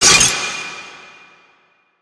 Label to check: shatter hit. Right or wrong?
Right